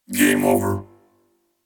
Race Robot Game Over

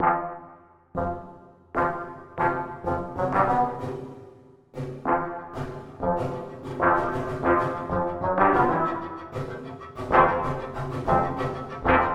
Suspense

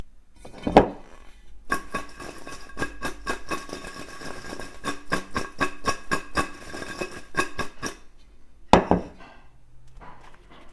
Shaking Potato Nuggets In Metal Bowl

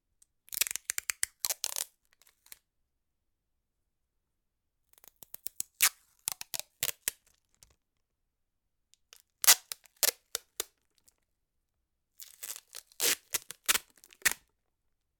Duct Tape Sticky Sound

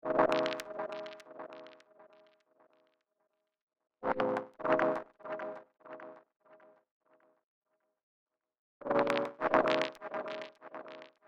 Delay chord

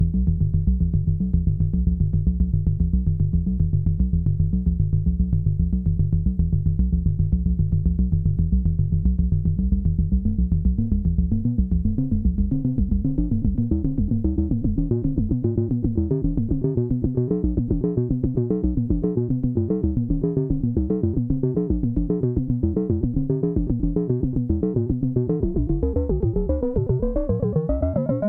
VCO Bassline

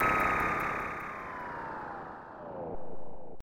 Drop tha bomb

